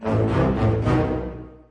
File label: fell short; Zoomatch